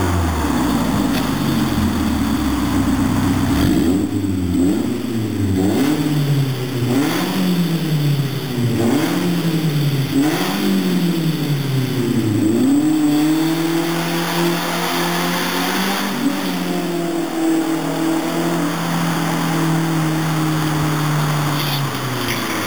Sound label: 318is tunnel; bastuck